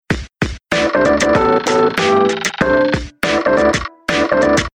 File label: Message Alarm; SK telesys SK-S150